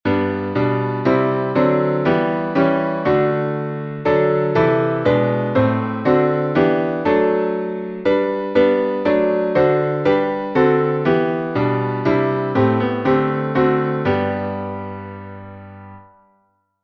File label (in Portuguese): salmo playback